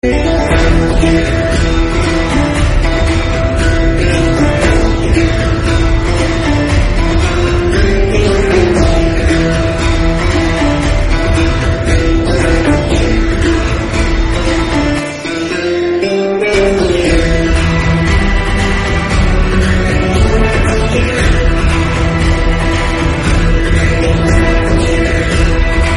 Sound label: entry music fight scene; rocky